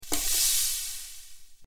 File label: slots scroll